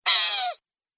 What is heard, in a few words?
Gremlin Brave Heart, monster; horror